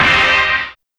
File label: Hit Smear